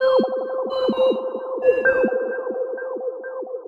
Sci-Fi Radio 130BPM; Loops

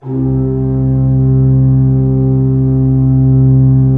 C2, Cathedral Organ; Propeller Island

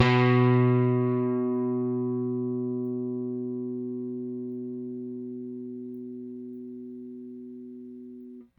RickThumb-C, RickenbackerBass

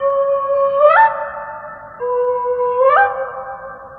Channa Flute

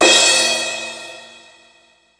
Dance Crash, Ensoniq ASR-X Pro

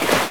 Ocean Waves; SNES; Rushing Beat Syura (Peace Keepers)

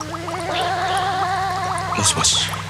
Kaos FX, Ambient Grooves

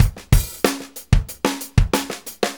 Fonky, Drum Tools, Loops; Sonic Foundry (Sony Creative Software); Loops & Samples Drum Tools

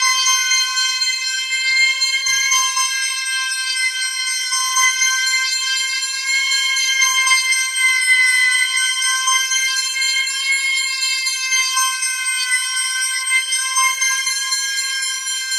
Arpeggio, keys; Sonic Foundry; James Johnson Spektral Minimalism; Sublime Keys

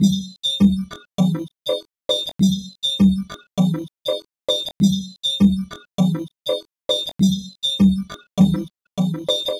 Beat, Electronic Beats; Sonic Foundry (Sony Creative Software); Myths of Technology; Hollow Gate Beat

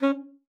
C#4, ALTOSAX; Xsample VOL-2

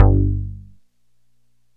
JUNO SEQ, Juno Synths; E-MU Formula 4000 Series Vol. 3; Analog Odyssey

